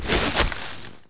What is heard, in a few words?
Med step, Dino